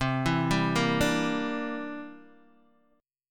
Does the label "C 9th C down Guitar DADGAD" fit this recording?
Yes